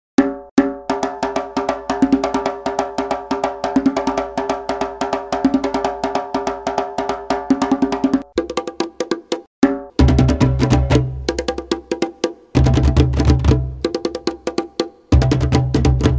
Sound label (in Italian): djembe